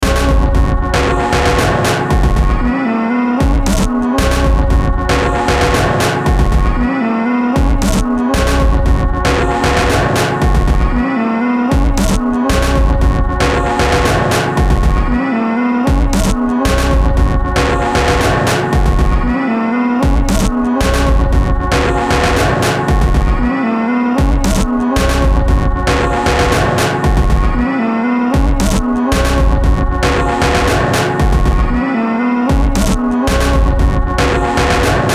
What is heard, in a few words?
BEAT DISTORTED, Beat Loop Distorted Ambient R&B Hip Hop